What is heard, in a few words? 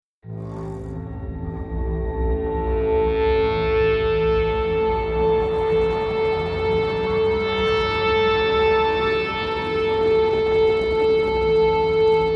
choose team, music